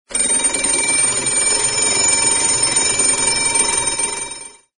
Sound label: School bell